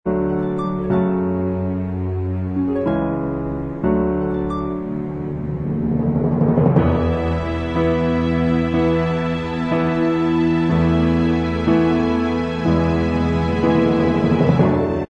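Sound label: instrumental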